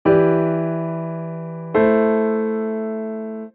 IIIm7 VIm

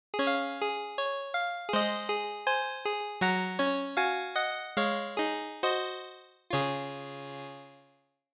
tonal center

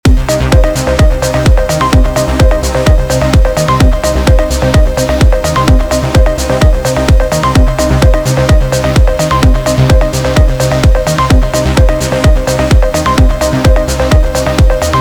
only min3

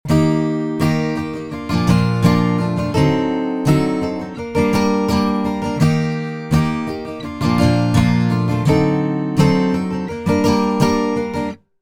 guitar

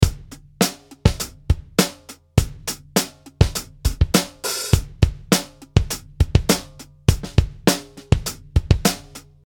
ghost drum

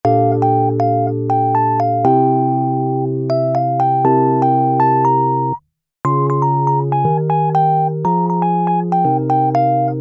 modulation

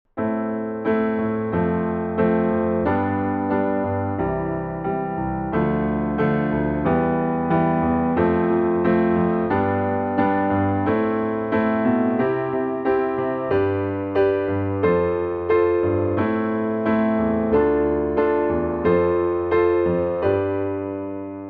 diatonic piano

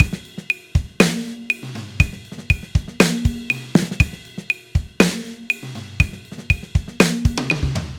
timefeel half

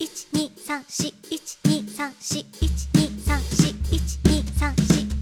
backbeat jazz

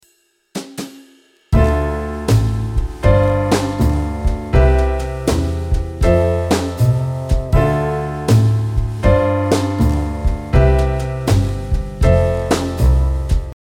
III V sus4 7

